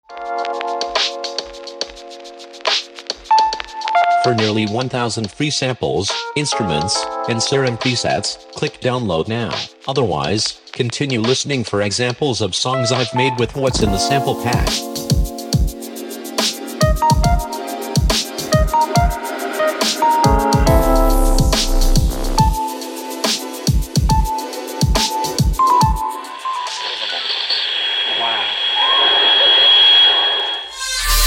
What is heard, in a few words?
Promo, EDM; 7rystan's 700+ FREE COLOSSAL EDM SAMPLE PACK